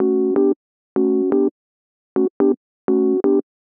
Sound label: 125 Rhodes Dom A, Keys, Nu Jazz House; PowerFX - Nu Jazz House